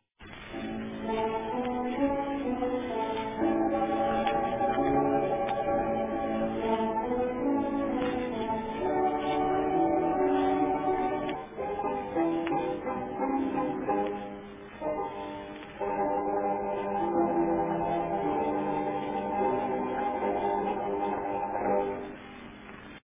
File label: mandolin orchestra